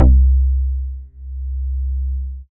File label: BASS MONO